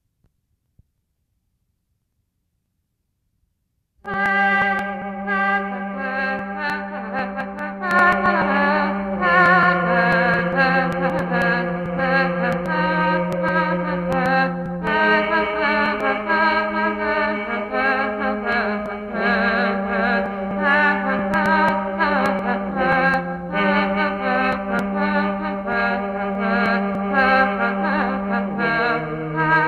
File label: Haec dies Organum